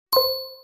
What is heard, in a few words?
system mail